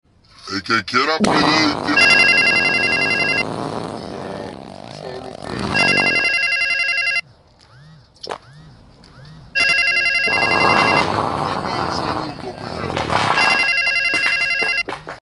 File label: cellphone; don pollo